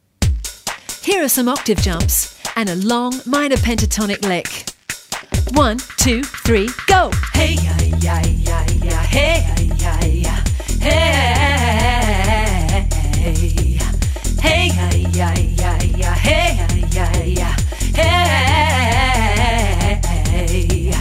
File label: licks exercise